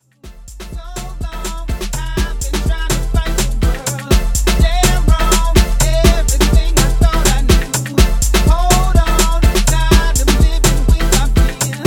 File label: Uk Funky; Uk Funky Version